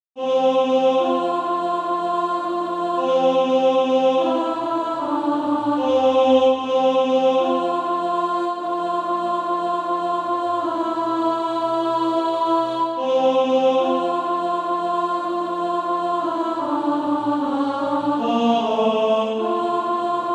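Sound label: alt, Practise Music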